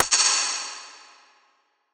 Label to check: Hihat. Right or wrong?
Right